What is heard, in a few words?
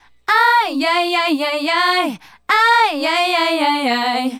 Female Group, Hip-Hop and RnB Vocals